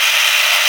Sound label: crane move; Birthday Party Bash; Wii